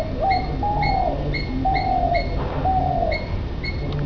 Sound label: streptopelia chinensis; srilanka